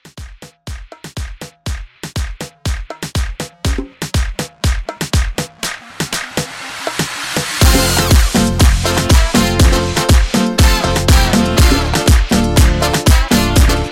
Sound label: Latin Re Drum